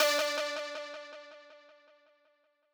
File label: lead